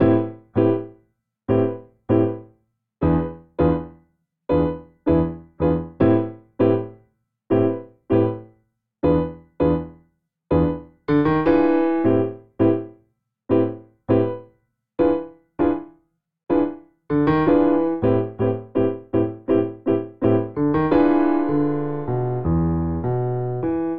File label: Vamp Upbeat Minor Soul Funk i - V7b9 A Minor 80 bpm, Grand Piano; MIDI Clips; Ableton; Factory Packs